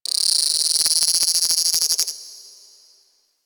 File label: Ocean Critter Insect; Warframe; Grineer Ocean